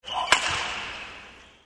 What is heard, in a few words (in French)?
frappe forte